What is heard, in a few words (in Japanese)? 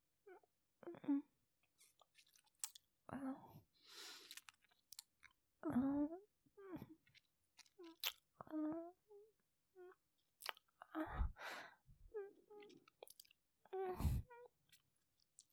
キス; 【マゾハーレム】